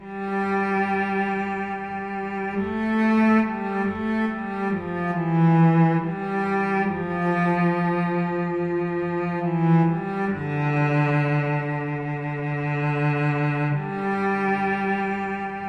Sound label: Cello Only